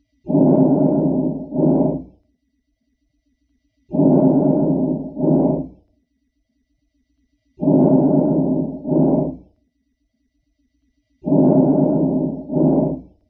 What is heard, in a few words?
Vesicular Sound